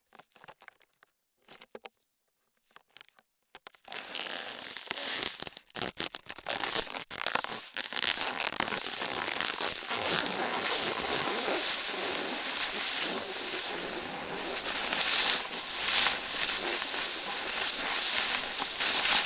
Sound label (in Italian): Sulla neve fresca